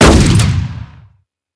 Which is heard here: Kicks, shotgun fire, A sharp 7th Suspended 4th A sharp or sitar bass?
shotgun fire